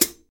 smooth hi-hat sound a sharp key, closed high hat sample